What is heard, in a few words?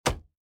wood hit; out of reach treasure royale 2020 gamerip pc